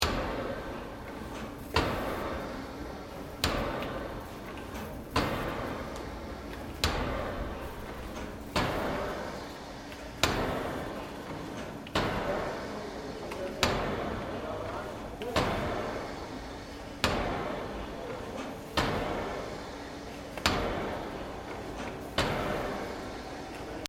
Steam Engine Operating Loop, Colonial era machinery; Trains; Vehicles